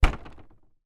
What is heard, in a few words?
impact wood headboard bed